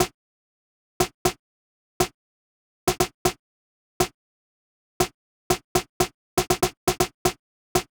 Breaks Snare; lmms; madmans; VariBreaks

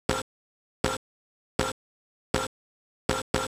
drum; hydrogen

